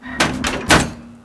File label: ladder hatch close